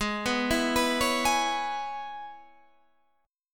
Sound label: C sharp Minor 7th; Gsharp; 16,14,14,16,14,16